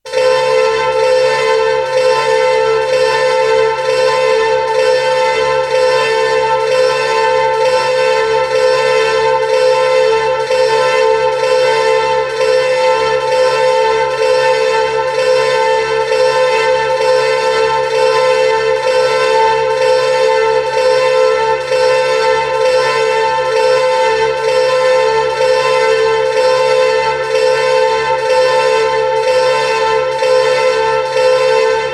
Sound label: Alarm